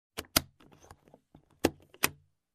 Luggage Lock Click

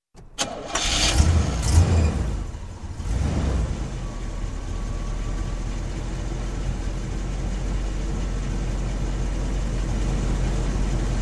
Muscle Car engine ignition and running idle

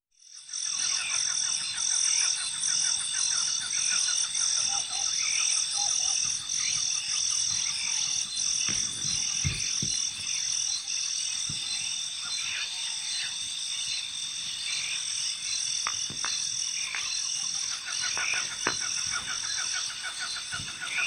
Birds Nocturnal Vocalizations

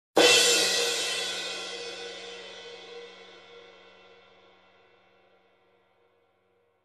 Hard Crash hit

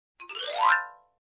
Xylophone scale up fast

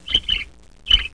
Bluebird short chirp

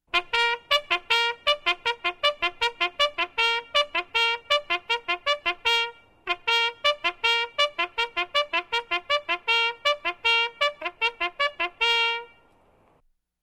Trumpet First Call

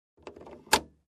Aircraft bin lock click sound

